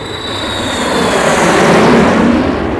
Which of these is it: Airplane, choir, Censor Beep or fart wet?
Airplane